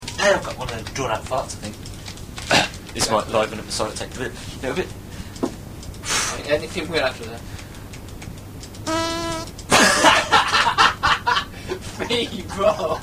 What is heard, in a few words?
feeble, fart; Tiny Squeaker